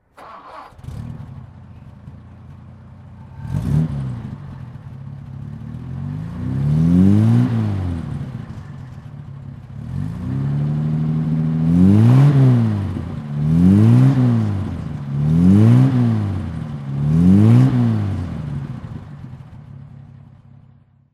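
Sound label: jaguar xk120 ots 5speed throttle, engine sound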